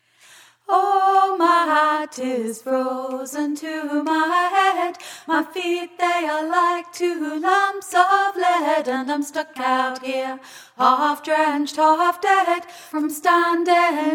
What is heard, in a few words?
bass